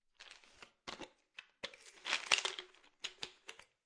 Pill Bottle Large Open Grab Pill, Foley, Pill Bottle, Large Plastic Bottle, Open Twist Cap, Grab Single Pill; Lid; Lids